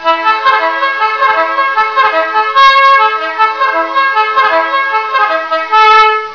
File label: reville